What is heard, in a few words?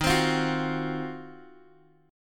D sharp Minor 6th Add 9th D sharp Guitar Open D, chord